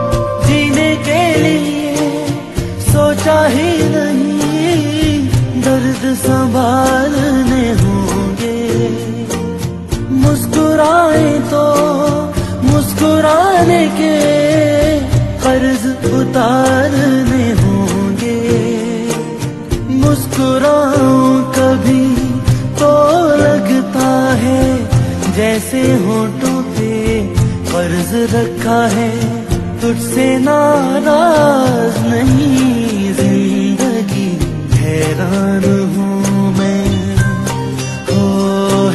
Sad Song Ringtone

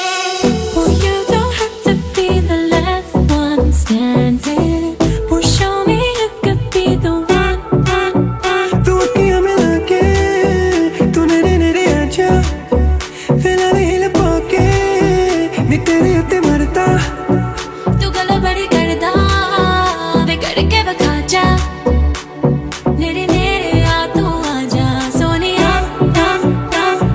Sweet Punjabi Song Ringtone; ringtones

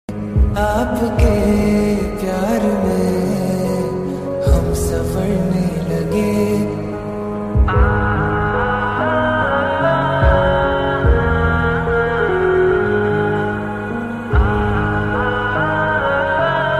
Telugu Movie Song Ringtone

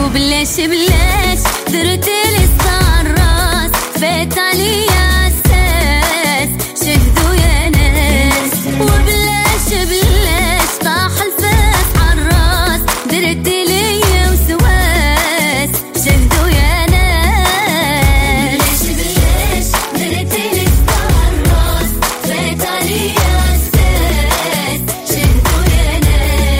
Arabic Song; ringtones

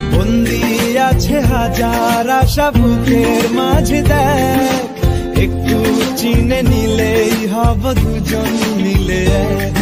Bengali Song Ringtone

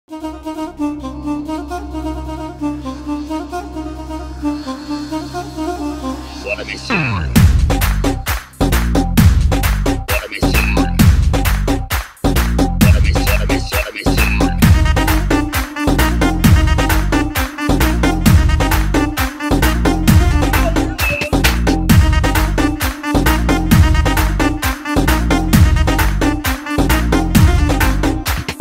Music

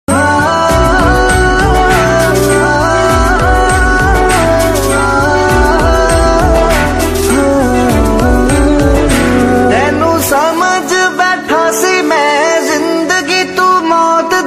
Sad Punjabi Song Ringtone

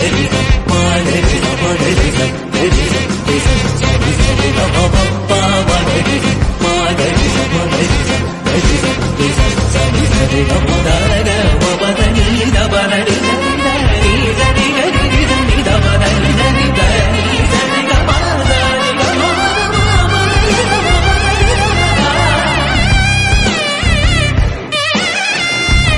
Music Ringtone; Kantara

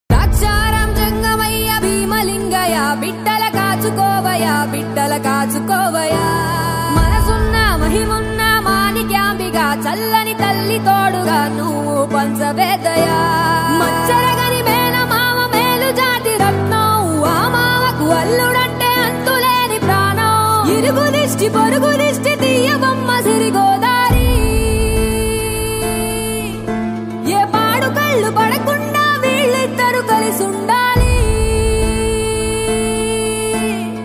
Movie Song Ringtone; Venkatesh Daggubati; Venky Mama

